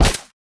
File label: silencer; midnight guns